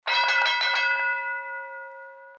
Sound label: Challenge Bell